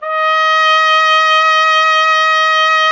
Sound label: BRS TP, TRUMPETS; Roland - LA Composer vol. 1